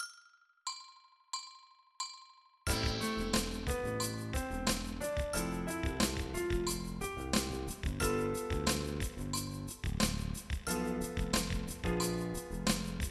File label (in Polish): Z podkładem